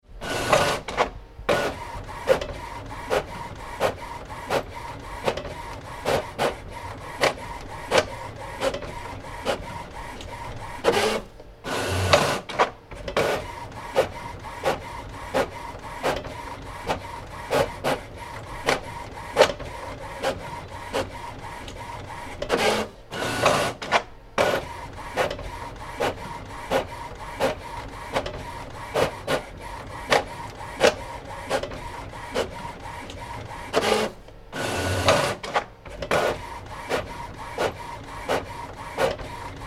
Printer Bubble jet, Small Portable - Various printing sounds; Printers; Tom Hutchings